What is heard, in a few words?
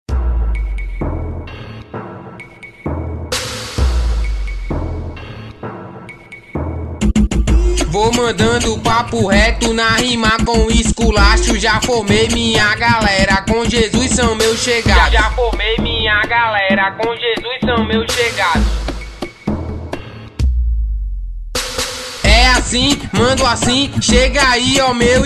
gospel funk